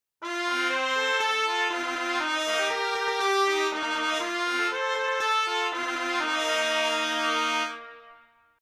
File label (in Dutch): trompetten; Trumpetteers; Assepoester